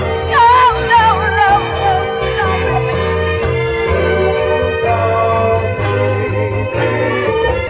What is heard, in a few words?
strings and voice oh no no no, Loops; Phonky